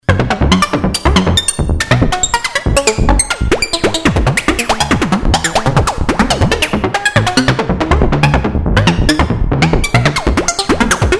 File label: Plan-B Model 25